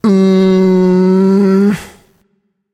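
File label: thinking, nonverbal; smite; voicepack; khepri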